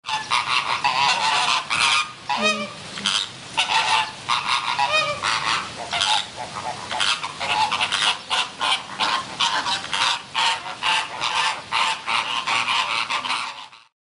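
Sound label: flock of flamingos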